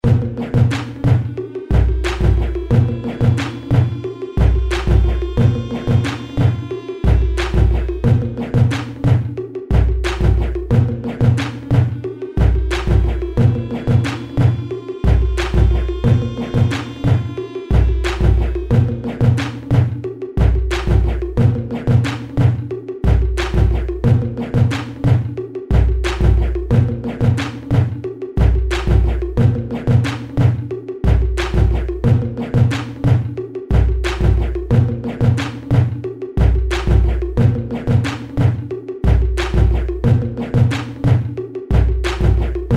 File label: Back Beat